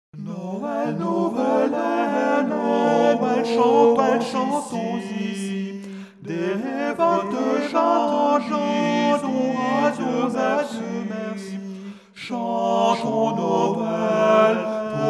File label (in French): noel tenor